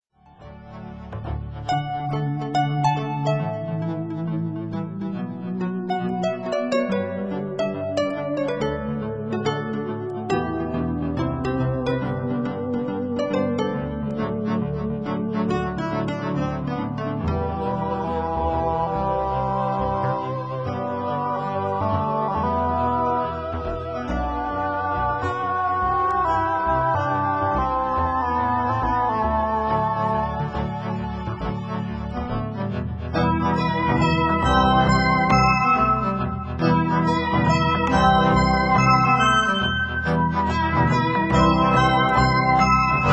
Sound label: rhythm in pews